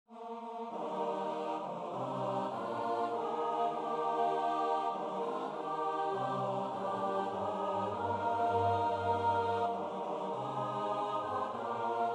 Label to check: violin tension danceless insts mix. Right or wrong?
Wrong